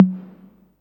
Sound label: Minimal Perc; Minimal Percussion